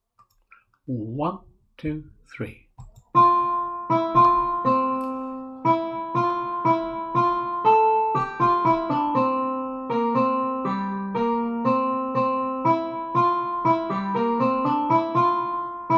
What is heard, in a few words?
Bushman's Waltz - harmony practice file